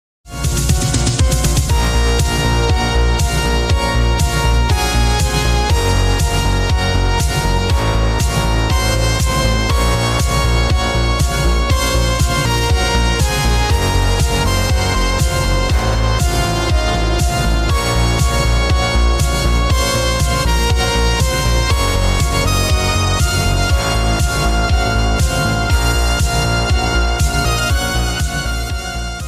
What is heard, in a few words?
ringtone instrumental ringtones; fragments of destiny